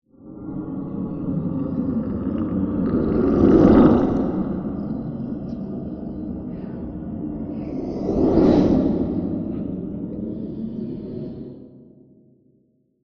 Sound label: Erie Space Ghost, Science Fiction; Blast Wave